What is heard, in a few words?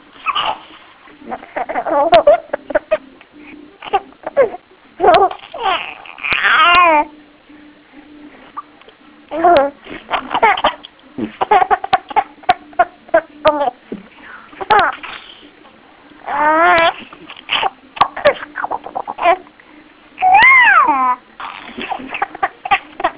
Laughing; giggling